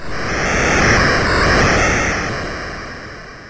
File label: BASIC sid MOS6581R4, GAMES; Sword of Fargoal